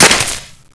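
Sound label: ricochet dirt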